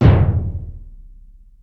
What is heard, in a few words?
LOW LASER; BEAT2000